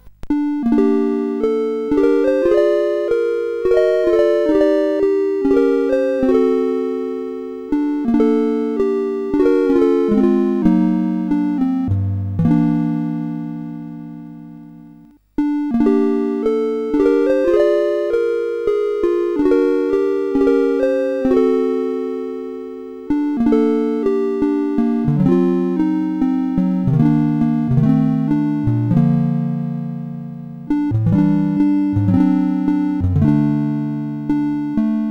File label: Nocturne, CSG8580R5; sid; hvsc